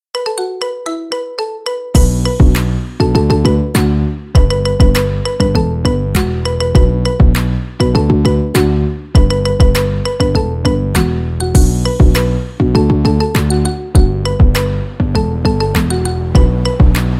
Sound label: marimba remix